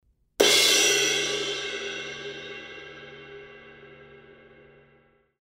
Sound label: K Con Med Crash, cymbal